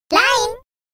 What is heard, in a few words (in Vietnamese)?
am thanh thong bao line vui